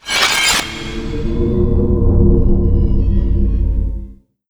Sound FX Scifi Organic; Audiopapkin

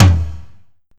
Tom Mid, Death Metal

